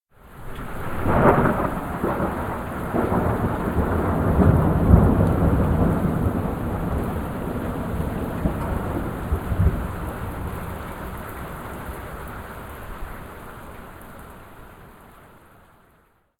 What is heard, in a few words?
Thunder; SyrinScape